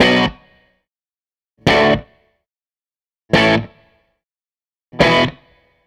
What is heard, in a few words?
SG Guitar Loop